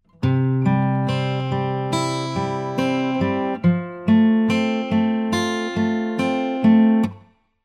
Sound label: guitar Arpeggio